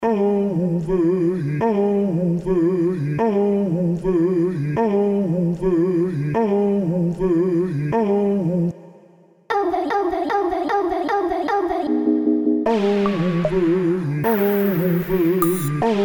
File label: 76bpm; sauce noire; beat pour mcee